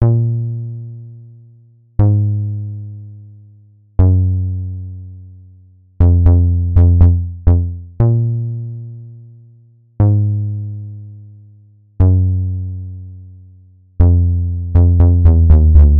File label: Bass